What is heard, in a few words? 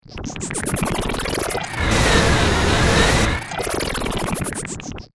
Stutter Ascend, Production, Element, Stutter, Ascend, Hit, Descend; BlastWave